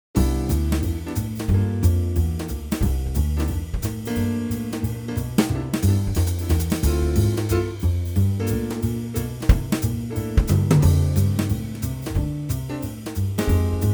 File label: rhythm track